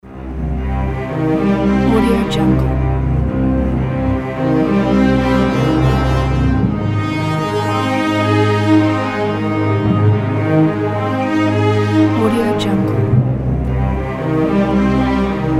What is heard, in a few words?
halloween story